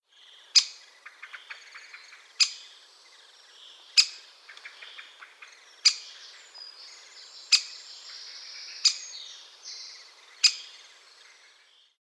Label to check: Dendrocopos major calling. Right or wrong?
Right